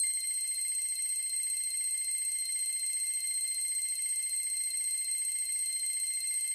count Up Loop; bloodsuckers2

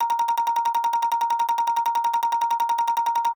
count Up Loop; mythic maiden

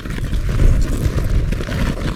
reel slide; godsofgold

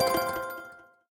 count Up End; gonzos gold

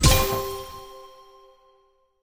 lionland; serengeti kings

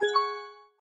dialog Popup; americanroulette3

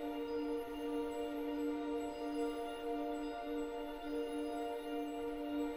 main Game Chord Bb; fairymirror

